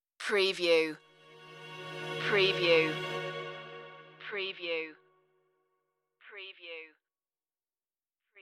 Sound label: dark wood strings